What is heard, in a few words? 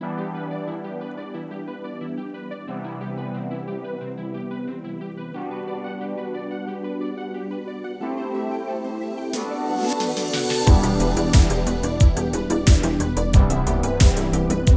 Rainy Synthwave